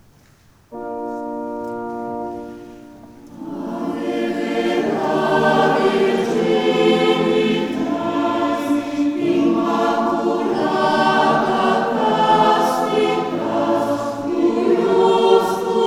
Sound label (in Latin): Ave Vera Verginitas